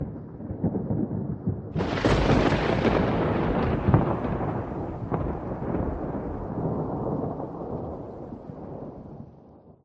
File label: rainstorm heavy; Nature